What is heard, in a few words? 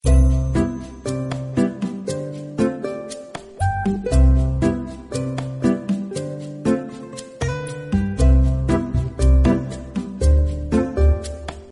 back Music